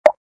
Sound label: water droplet